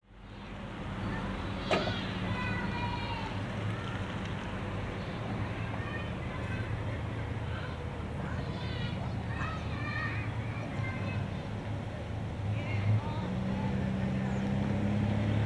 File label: Car Driving Away